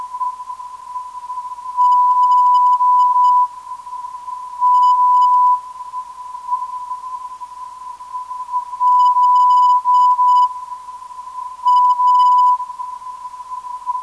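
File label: non-directional beacon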